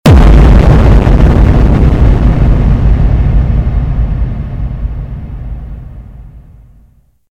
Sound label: boo; garrysmod